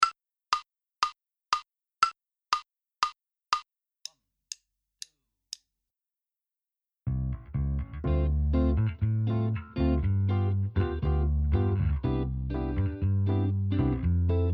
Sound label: Minus Drums; Inst Ensemble